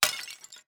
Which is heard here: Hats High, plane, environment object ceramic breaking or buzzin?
environment object ceramic breaking